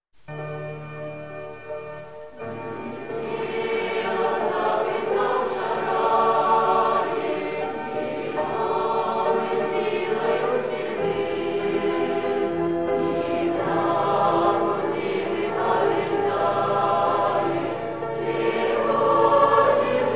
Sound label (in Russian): Пение; Молодежное Абакан июнь